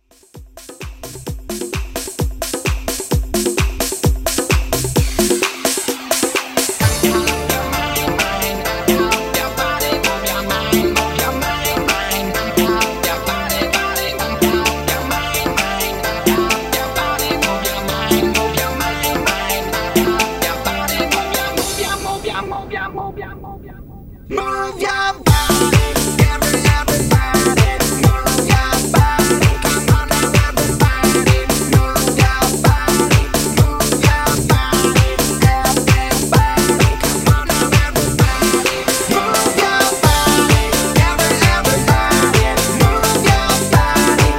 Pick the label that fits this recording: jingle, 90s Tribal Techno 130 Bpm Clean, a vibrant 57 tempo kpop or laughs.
90s Tribal Techno 130 Bpm Clean